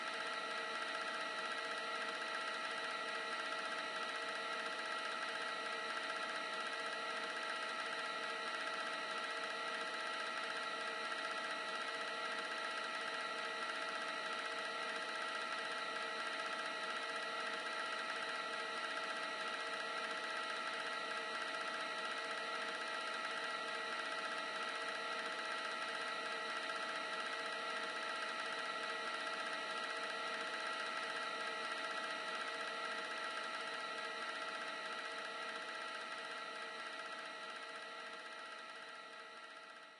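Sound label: inventory pda static; STALKER; IWP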